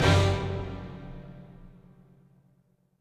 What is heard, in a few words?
FIGHT END; MX OCKFIGHT END; Spider-Man 2; PS2